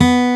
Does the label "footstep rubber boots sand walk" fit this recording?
No